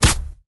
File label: attack; Endless World Idle RPG